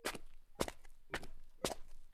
Shaker Walk Loop; gamerip; Mossman

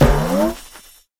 Character Down; hello kitty friends match; android

